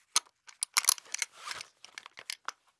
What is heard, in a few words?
Seat Belt Click With Strap Movement; Fairlight Sound Lib